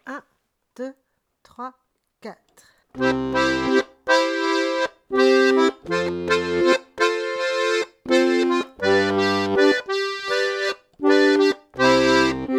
scottisch noire 82 rythmique; de Moustier

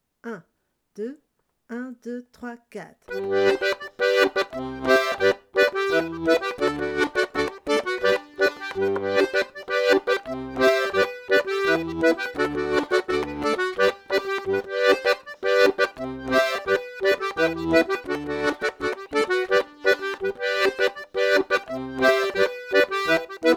scottisch rythmique

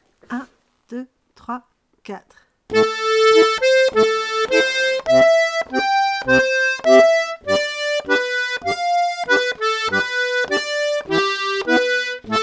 polka croche 100; polka du moulinet

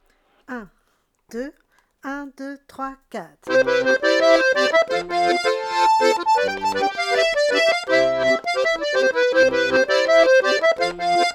scottisch; de Moustier blanche 82 total

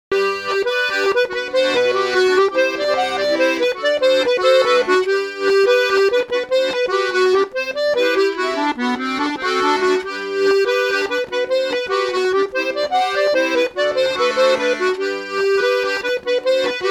valse

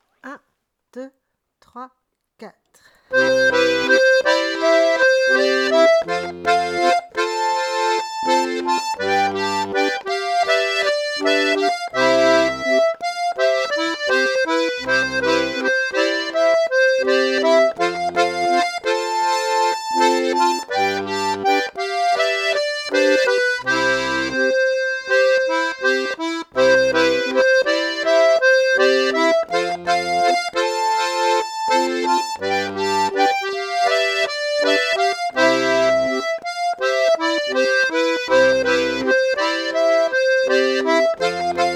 scottisch; de Moustier noire 82 total

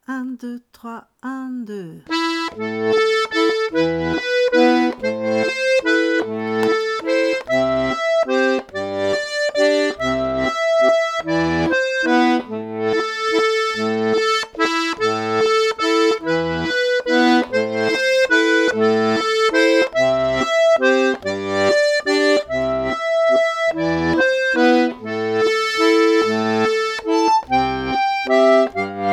valse; du grand banc 144

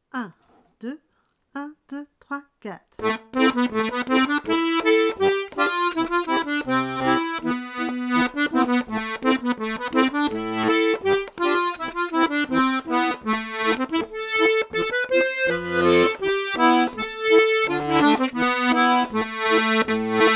scottisch; a lamante blanche 82

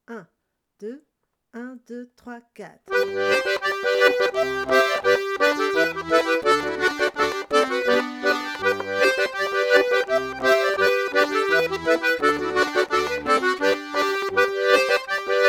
scottisch

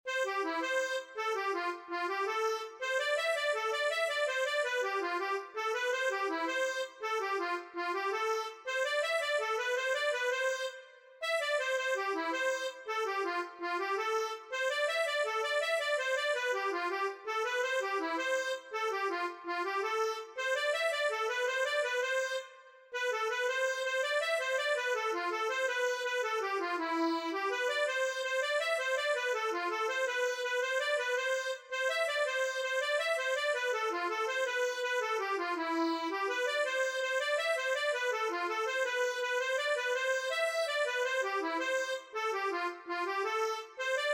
scottisch mazurka acc

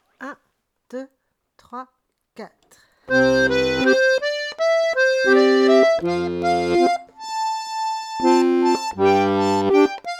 scottisch; de Moustier noire 82

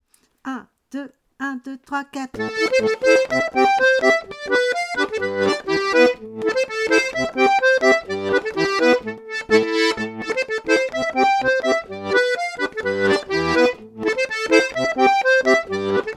polka noire 126; polka du moulinet

